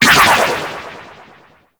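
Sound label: Galleon Turret Projectile; Warframe; Ambience; Grineer Galleon; Vor Galleon Fight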